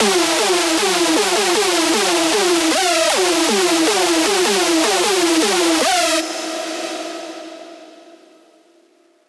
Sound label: screech loop E min 155; Music Loops; GhostHack